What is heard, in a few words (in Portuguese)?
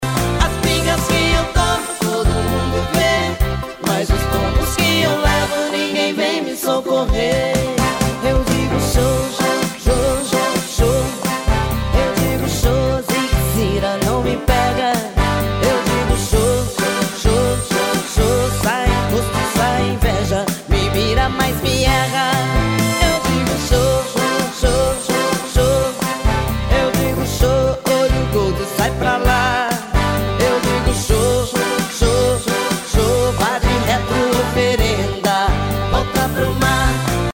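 xote ringtone toque para celular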